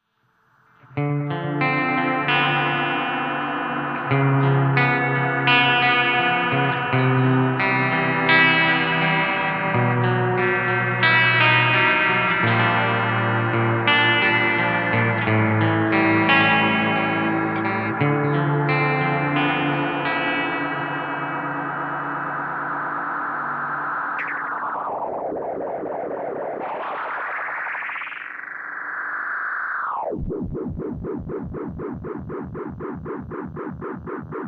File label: spacey; Damage Control